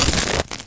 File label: glass walk